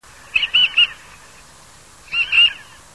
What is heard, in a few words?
Marmaronetta angustirostris (song)